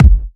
Soft Kicks; Vengeance Samples

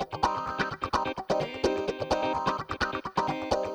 Electric Guitar - C min, 128BPM; Vengeance Samples; VEH3 Electric Guitar Kit 2

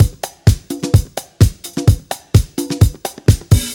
Housebeats, 128BPM; Vengeance Samples; VEH2 Loops

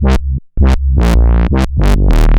Roland A Line, Roland S100

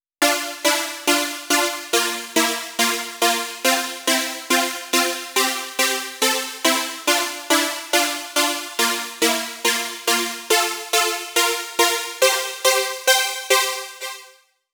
Synth Chord Chorus, 140 BPM; Vengeance Samples; Song Kits; Take Me Away

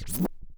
back spin short, vinyl record

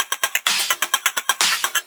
Electro Loop 128BPM; Vengeance Samples

